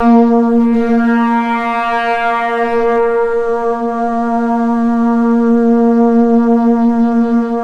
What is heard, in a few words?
A#, Synclav FM; Big Fish Audio; SPACE2